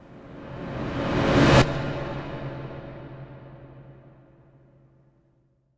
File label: impact rush; M-Audio Pro Sessions; Liquid Cinema